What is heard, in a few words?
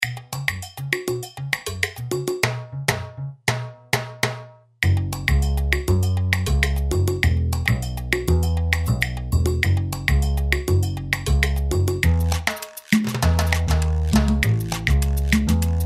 salsa